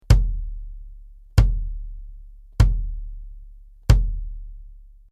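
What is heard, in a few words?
BASS Clr Hard Plastic, bass drum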